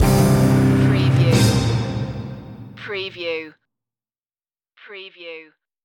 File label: lone wolf stinger